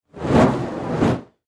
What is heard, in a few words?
Torch Movement, Torch Movement Fireball Burst Twice Long; Flames; Sound Design; Flame Sound Effects